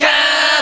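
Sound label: crowd "ka", Japanese; HeavenStudioPlus; fanClub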